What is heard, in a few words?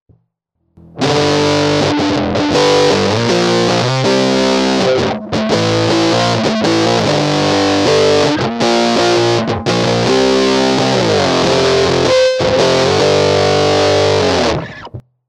metal; ak-workshops